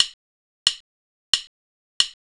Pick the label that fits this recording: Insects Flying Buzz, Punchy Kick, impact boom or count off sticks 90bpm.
count off sticks 90bpm